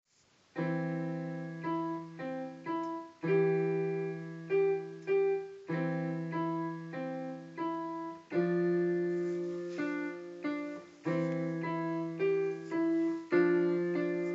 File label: keyboard